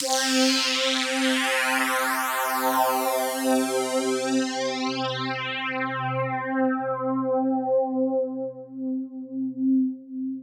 NL A4 C3, STRINGS; Music Store Sound Service; Einstein

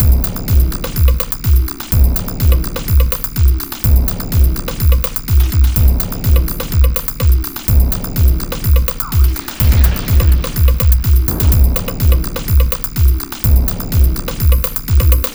Beat, Drums, Eclectic Electronica

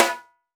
House Snare; Snares

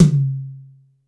B2 - Rock Tom, Yamaha PSR-E323 Rock Kit

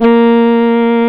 REED A#; Big Fish Audio; Synclav FM

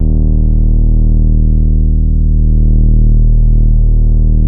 BASS STOP

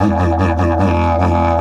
150, DIDGE RIFFS; Big Fish Audio - Didgeridoo and Other Primitive Instruments

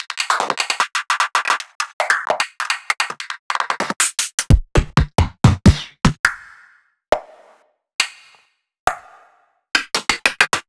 Hybrid Beatbox Drums; Antidote

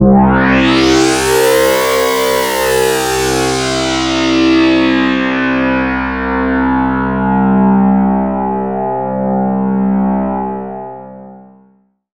synth, Synth Atmos (pad); ambient; Chillout

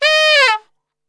D# 3 SAX; Best Service; Brass Super Section

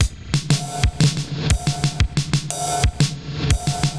Spring Verb Compressed TR 505 120BPM

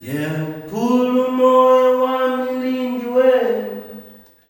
"YEAH-KULU", MALE; Spectrasonics; Heart of Africa 2; S.MALEAMB

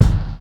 Kick, Ensoniq ASR-X Pro

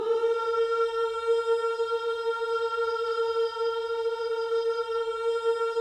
OOH A#4, Gospel; Spectrasonics Vocal Planet

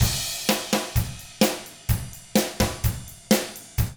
127 SURF ROCK

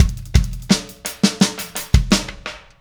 Static Beat Ending; Sonic Foundry (Sony Creative Software); Indie Rock Anthems; Session 13 - Static

